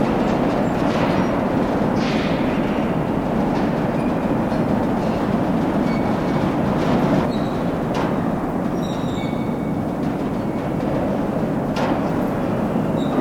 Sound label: Ambient mine no birds; Ambient mine03 no birds; Xbox 360; gamerip; dungeons dragons daggerdale; xbla